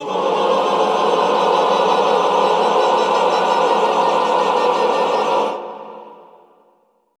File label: classical choir, cluster; Best Service; Extended Classical Choir